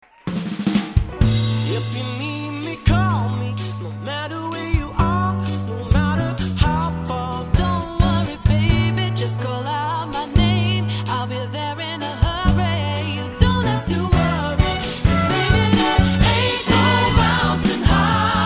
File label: jazz